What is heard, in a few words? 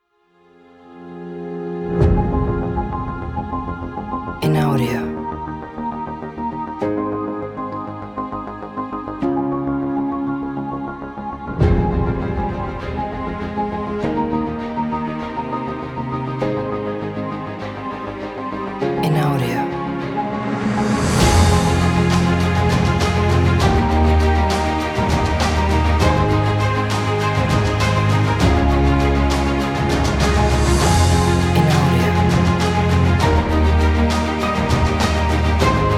Epic Motivational; Short Version